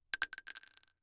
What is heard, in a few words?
Weapon Bullet 3030 Rifle Shell Drop; AdobeCC